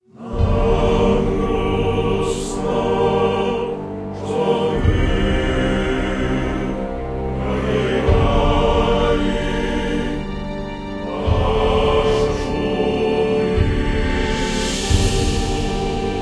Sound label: Rebel Lose